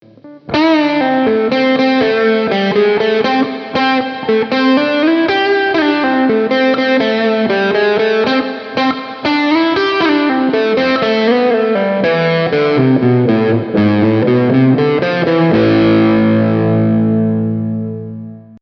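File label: Ibanez Premium 721FM lick bridge pickup; ibanez premium testbericht 721fm-bif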